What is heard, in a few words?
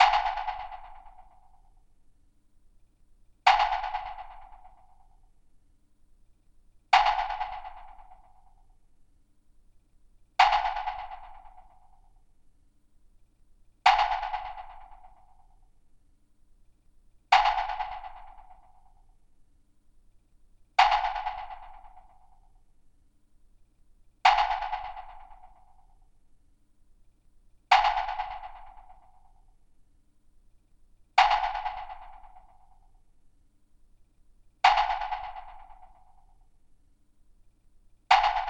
cosmic signal